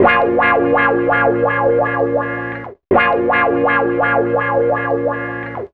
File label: 165 F, Guitars; Total Drum&Bass; 90 s Sample CDs